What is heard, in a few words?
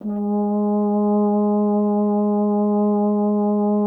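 French horn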